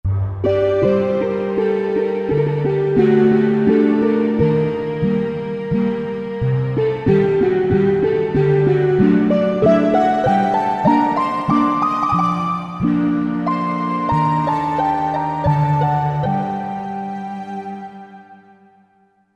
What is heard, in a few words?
Pits Strng, Korg MSC-11S Brass